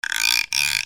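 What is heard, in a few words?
percussion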